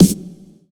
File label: tight lows acoustic snare f sharp key; tuned snare samples